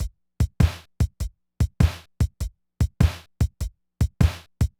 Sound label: Loop, drumtraks